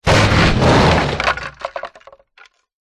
impacts roll over smash metal glass wood small wood debris tail